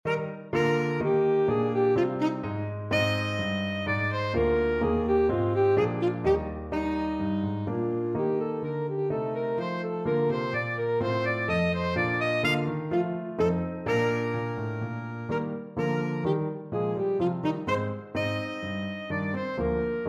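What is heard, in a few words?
bourree, alto saxophone; handel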